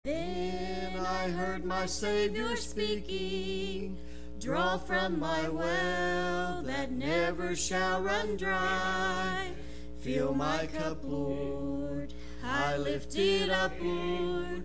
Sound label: singing